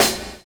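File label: SAX N GUITAR; Univers Sons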